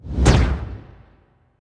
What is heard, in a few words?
saurian boss martillo